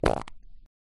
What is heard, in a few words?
Quick An Small Fart